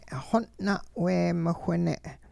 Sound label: Brush Dance songs, Hupa